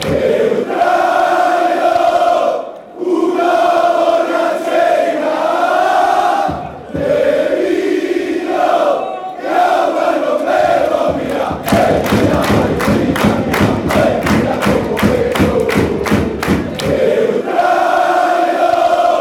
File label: stadium, fanchants